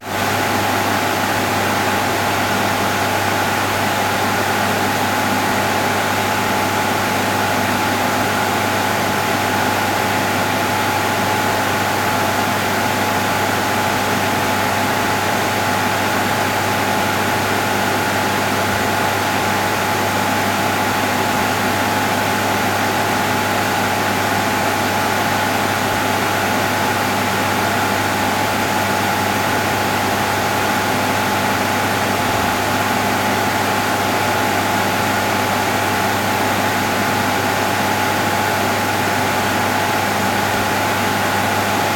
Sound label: ambient noise server room